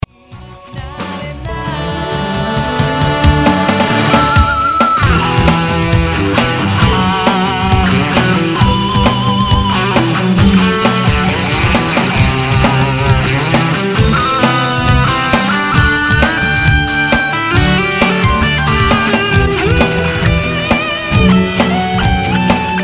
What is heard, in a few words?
guitar